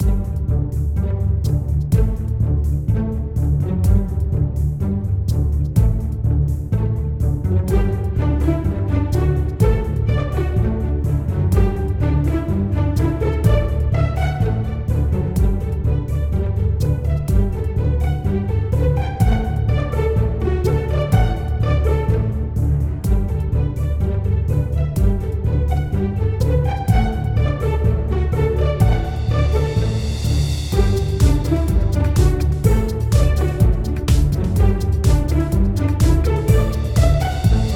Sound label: Casual Theme Looped; Dual Blade: Battle of the Female Ninja